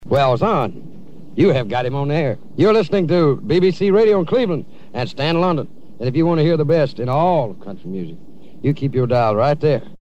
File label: jingle